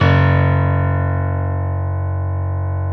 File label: GRAND P. F#1, DANCE PNO